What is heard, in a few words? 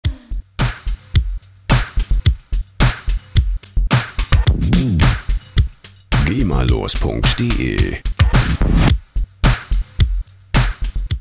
drumloop